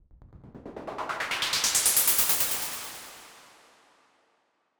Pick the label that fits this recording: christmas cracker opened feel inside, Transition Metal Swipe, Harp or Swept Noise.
Swept Noise